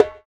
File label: Bongo; Vengeance Samples; VEH1 Percussion